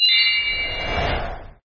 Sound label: spear; FreeKill